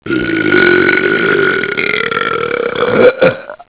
juicy belch; gearbox

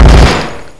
pdw burst; brainbread

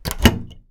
button; svencoop

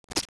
buzz, hornet; svencoop; aomhornet

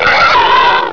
pain

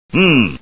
hmmm

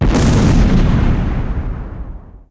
explos, ambience; dod

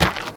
dirt; svencoop

